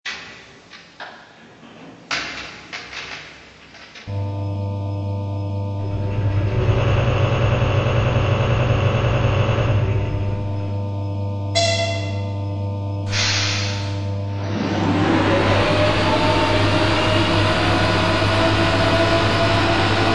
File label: CHS4